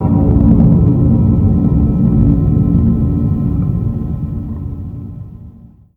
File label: humm; svencoop; ambience